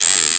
wind up

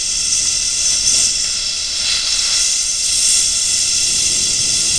gas

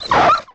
CER CRYA

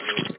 put away pile chips, roulette; java; Internet Gaming Software Solutions

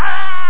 yell

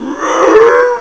SCARED; Carmageddon Demo

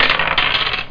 dice

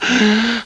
Inhale

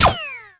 MG Impact; PC Gamer IT CD 41; roadwars

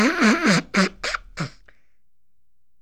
blow nose

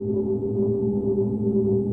hangar loop; jenquai

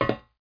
walk metal dry; MOTS

